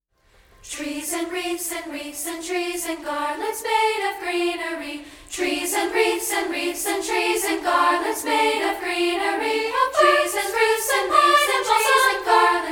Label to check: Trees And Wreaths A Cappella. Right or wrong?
Right